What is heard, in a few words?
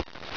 Deal Card; AntiQuadra 2.0.2